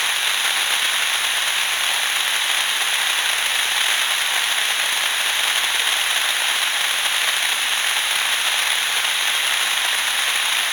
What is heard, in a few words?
SRF-T610V noise; noise（ノイズ）